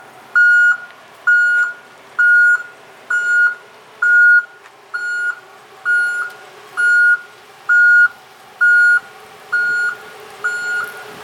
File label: Backing Up Beep